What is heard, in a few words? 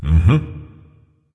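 non verbal agree, voice; cernunnos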